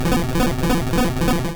Bonemerang; sfx; attack moves; Pokemon; gen 1; rby

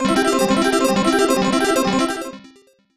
Jackpot Hit; Hisshou 777 Fighter; SNES; Continontal

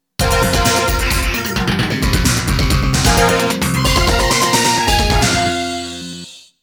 Clear Music

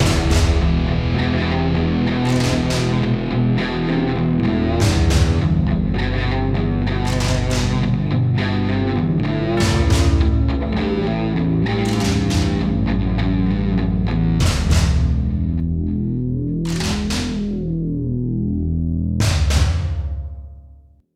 Win Match

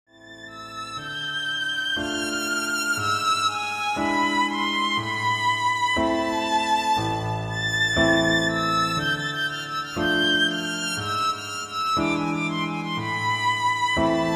Melody; Mad Father

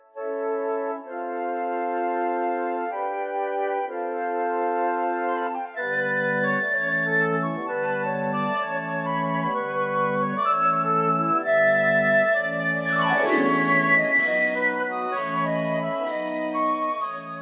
Outro Music

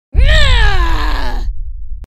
War Cry; Goddess of Trampling

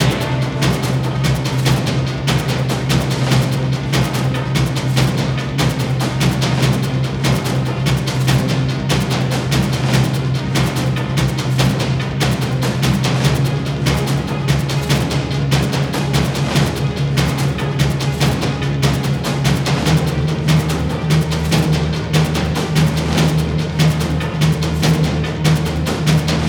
Loop Segment; Valley

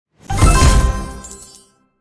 Coins

